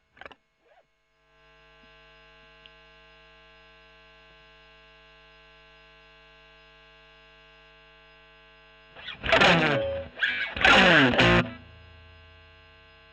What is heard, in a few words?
E.GTR Gefell OD